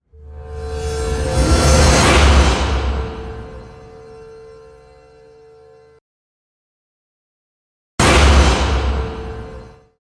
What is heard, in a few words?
bowed to burst